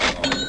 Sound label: Pick Up Shots; Spectre Demo